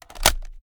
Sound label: reload mag in; gmod; viper; weapons